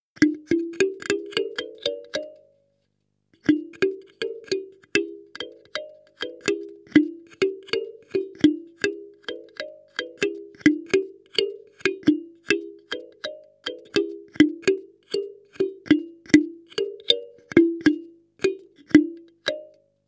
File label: e kalimba